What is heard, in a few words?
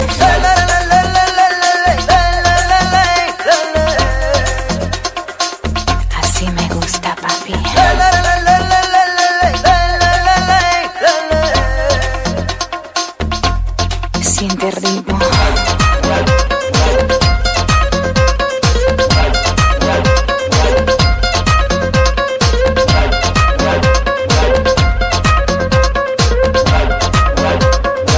electro flamenko; Latin